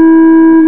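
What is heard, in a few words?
missile beep; Mobile; Motorola MPX200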